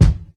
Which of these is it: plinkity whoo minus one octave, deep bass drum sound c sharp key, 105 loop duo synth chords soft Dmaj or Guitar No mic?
deep bass drum sound c sharp key